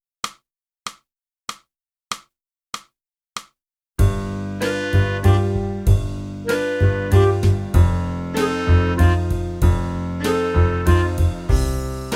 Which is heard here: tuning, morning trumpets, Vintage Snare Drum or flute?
flute